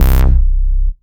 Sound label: Bass C, Offbeat Bass